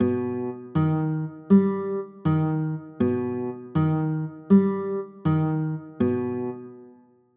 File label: g aug